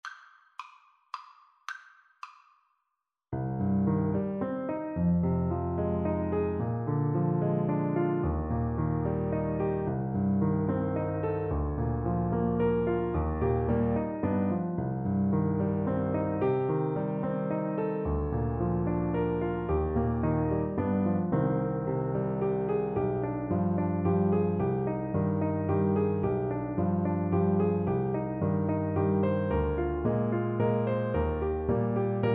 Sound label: der gang zum lieben trumpet